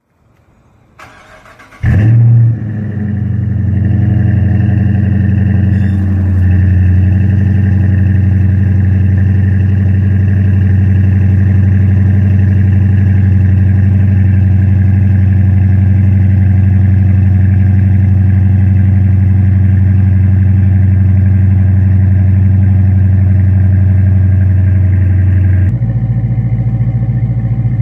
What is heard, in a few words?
2018 Charger RT